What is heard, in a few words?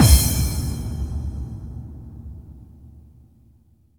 BD-FX, Dance Mega Drums; Best Service